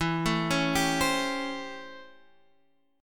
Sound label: A 7th Sharp 9th